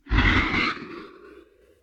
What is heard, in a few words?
Space Swoosh